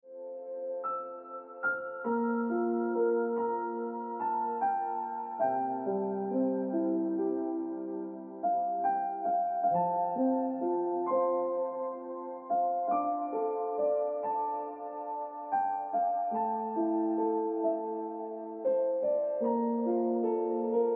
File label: Piano Music